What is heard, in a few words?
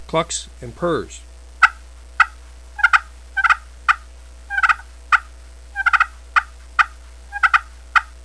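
rosewood clucks purrs, turkey call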